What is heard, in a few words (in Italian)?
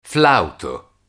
flauto